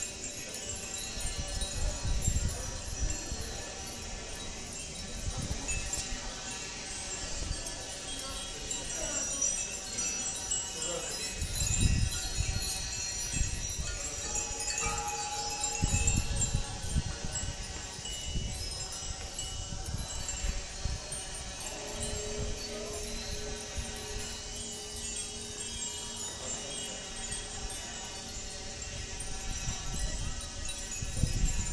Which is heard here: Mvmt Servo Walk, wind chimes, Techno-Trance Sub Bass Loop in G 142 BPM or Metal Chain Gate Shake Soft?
wind chimes